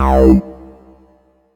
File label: synth bass hit; 'Go Fuck Yourself' samples; lolicore